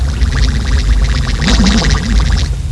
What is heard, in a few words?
WATERY